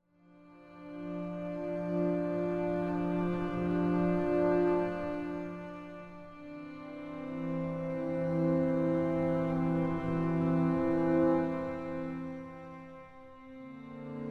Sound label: String Ensemble; Native Instruments Symphony Series String Ensemble